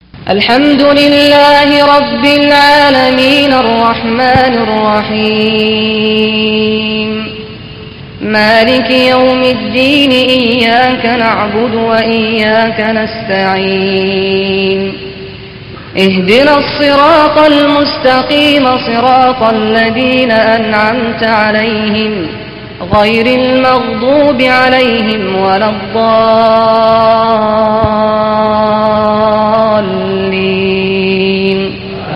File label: quraan faatihah; sad ghaamadee